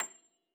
piano A, Thumb Tack Piano ff; Best Service ProSamples vol.53 - Classical Prepared Pianos; Total Piano

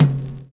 TOM FILL; Univers Sons

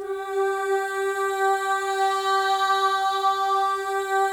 MOVING V2, Symphony of Voices; Spectrasonics

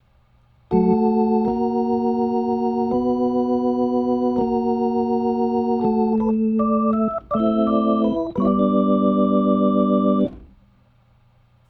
Soul Organ Riff, 70s Analog Funk

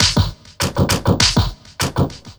philter beat synth toms; Sonic Foundry (Sony Creative Software); Ugly Remnants VOL-2

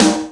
Snare Tight, Korg 05R-W; Korg Snares

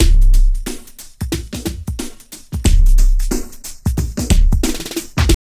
TRIP 90, trip loop

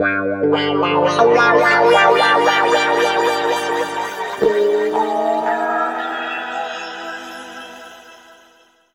G P-S WA -R, Guitar; East West; Steve Stevens Guitar Samples Collection; PTCH-SHFT+WA

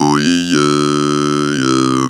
"oui yeah", Funky Vocals; USB Soundscan vol.18; TB MELODY

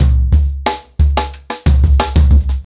90BPM, ACOUSTIC DRUMS

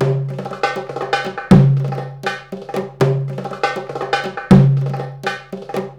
PERC LP, Arabian Traditions

